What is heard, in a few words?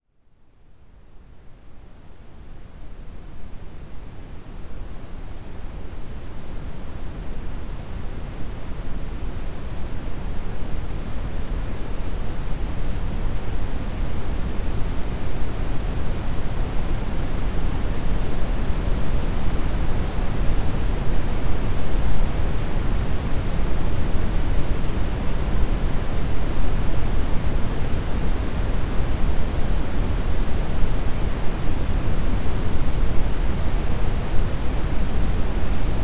Brown Noise; Relaxation - 30 min; RobinHood's AS Sessions